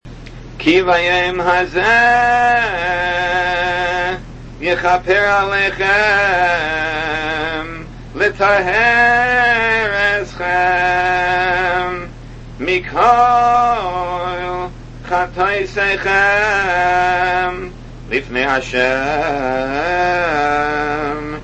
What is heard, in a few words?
Ki Vyom Haeh, nusach